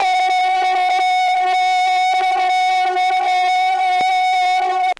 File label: feedback; Zaps and Beeps